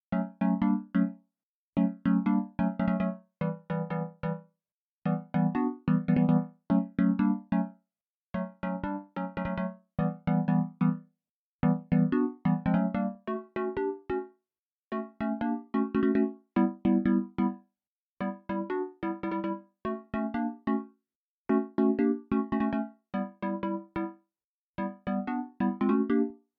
Chords; chippy

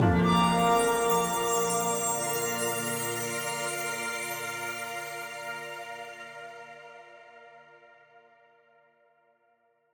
Jingles PING END; Semblance

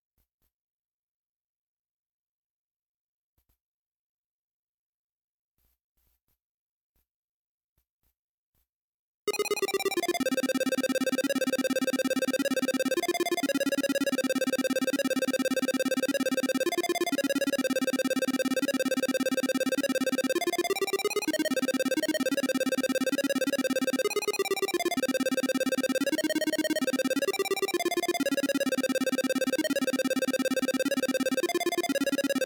Melody; chippy

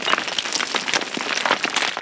Rubble Loop Short

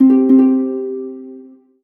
MUSIC EFFECT Solo Harp Positive; startide macos switch windows gamerip 2017

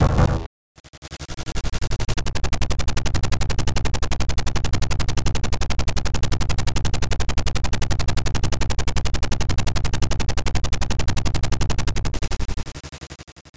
Helicopter Appearance

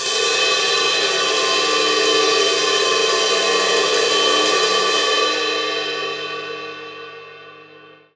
Hi Trill

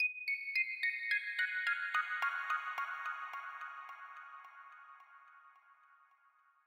Puzzle Complete; Hexcells

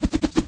Skill Effect; MilkChoco

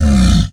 Roar; blastmorph pinball; xbox series xs

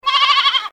Sleep Sheep; F.A.M.P.S

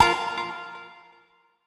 Street stroll shooting; popslinger; ps4 ps5 switch windows xbox one xbox series xs

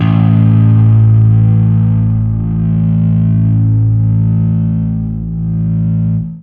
Fuzz A3

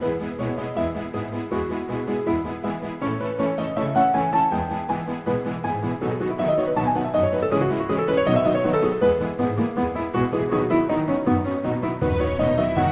Menu Music; Supermarket Mania; PSP

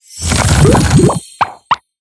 tree growing; talking tom gold run gamerip 2016

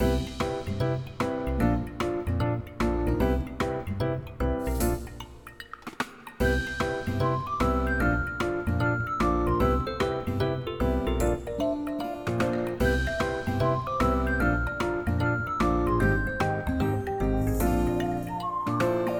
Mood Bright Medium

